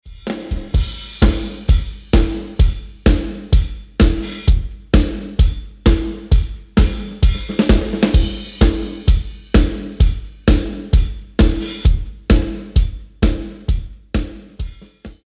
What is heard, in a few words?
Drums mixdown